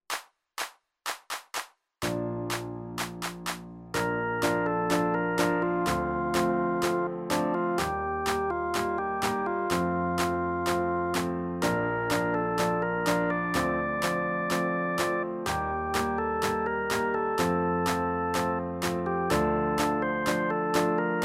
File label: karaoke